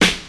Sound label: modern prominent snare b key